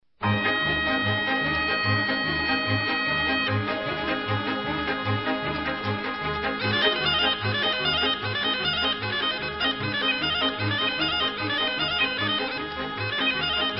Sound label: Hora Ceasului Romanian